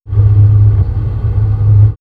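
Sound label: Sum Hum, electronic fx; X-Static Goldmine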